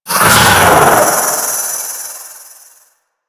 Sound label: vocal attack; Enemies; Vocals; Warframe; The New War; Archon; Nira